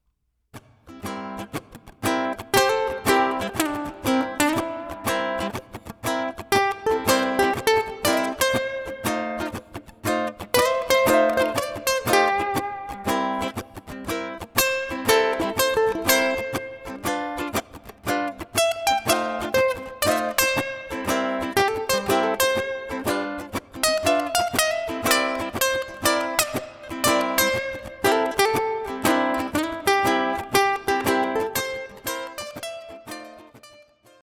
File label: ukulele blues